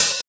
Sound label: dry open hi hat sample e key